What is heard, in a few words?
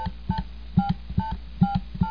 phone sample; furball prank